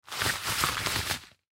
Rubber Glove On, Rubber Glove Put On Movement Cleaning Hand; Household; Blastwave